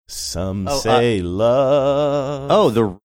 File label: singing